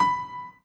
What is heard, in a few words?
Piano Chord